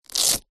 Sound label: foley sneaker childs velcro pull; zapsplat